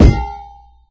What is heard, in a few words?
metal canister impact soft, physics metal